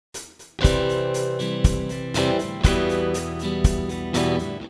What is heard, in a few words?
guitar